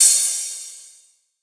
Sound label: Ride; Hats and Cymbals